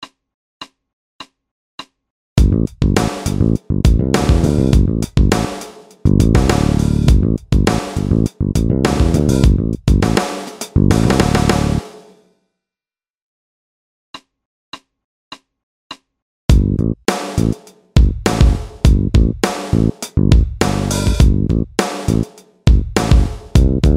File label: Bass And Drum Loops